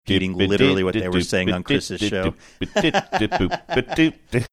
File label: singing funny song while talking